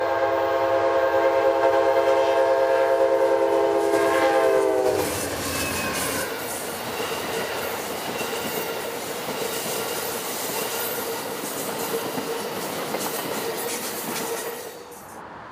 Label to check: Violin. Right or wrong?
Wrong